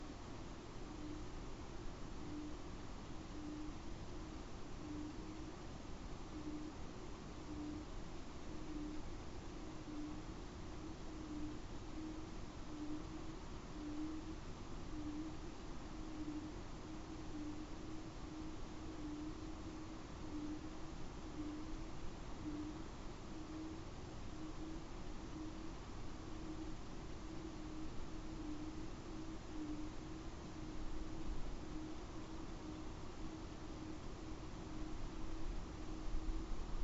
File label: weld noise